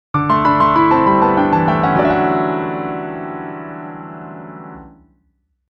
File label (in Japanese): 回復 ジングル